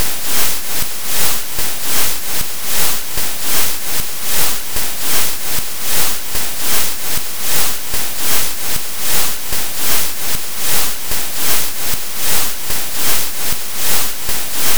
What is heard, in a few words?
sonification; After plotting psr 0329 54 in skynet’s plotting software I was able to Sonify the pulsar.